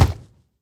step; zoochosis; lazynap; dolphin deepsea monster

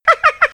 hunter pain short; huggy wuggy snpc; elmo

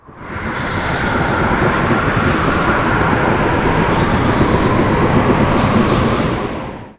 iceblast travel; blizzard spawn

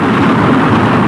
jet engine; dumpster baby titan; ButcherExe

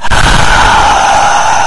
snow breath; blizzard spawn

